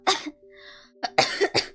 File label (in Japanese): 咳; Flashpoint Ultimate 13; 咳・くしゃみ